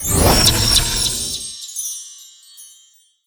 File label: butter Respin; butterfly staxx 2